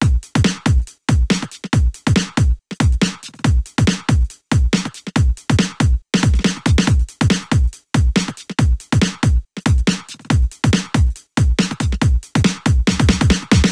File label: Loops BB 140, BreakBeats; Vengeance Samples